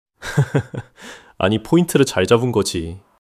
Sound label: laughter